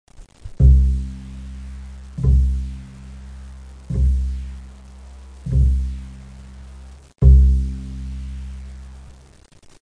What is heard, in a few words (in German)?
Kontrabass, Ton a